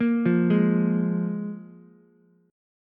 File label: F Minor Double Flat 5th, F, Ukulele; Fmbb5 arpeggiated; 3,5,4,x